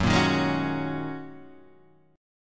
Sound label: F sharp 6th Add 9th F sharp Guitar Half Step Down